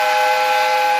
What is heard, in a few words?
whistle echoing through the mountains